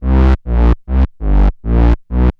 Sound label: Roland D Line, Roland S100; musicradar-synth-samples